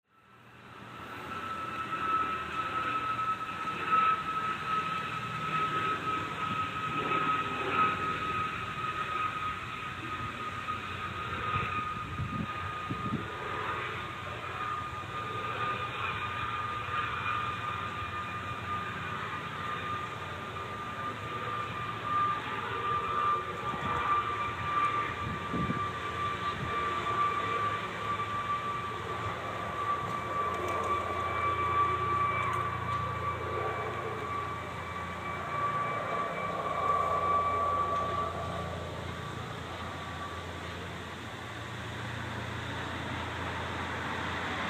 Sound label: Hereford City Soundscape